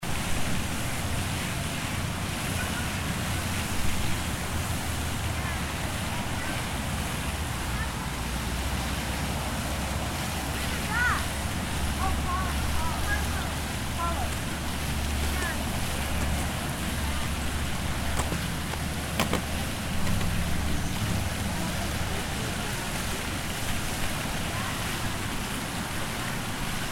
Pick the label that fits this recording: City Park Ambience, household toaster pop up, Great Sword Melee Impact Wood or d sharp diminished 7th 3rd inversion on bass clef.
City Park Ambience